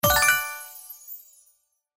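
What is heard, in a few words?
Symbol Win Regular; slots; House Of Luck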